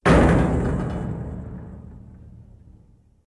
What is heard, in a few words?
Run Clang; Dino Donkey Dash